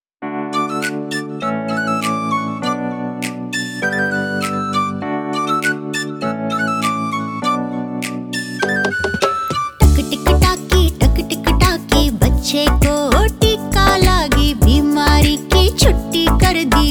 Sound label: vaccination song hindi; jharkhand